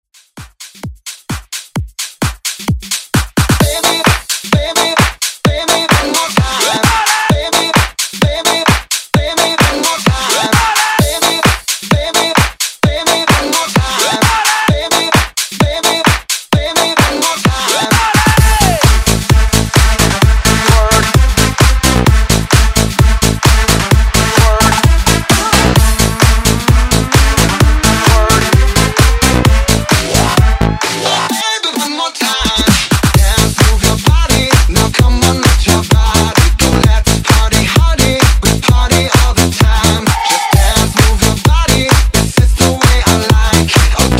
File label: Dance Redrum